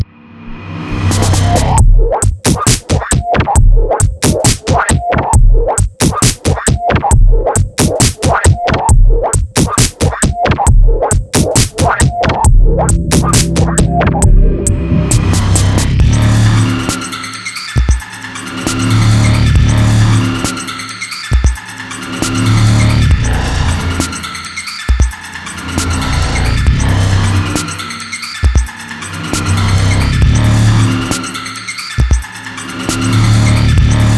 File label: beat